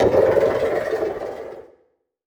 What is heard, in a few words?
Title Transition Burst; Adobe; Production Element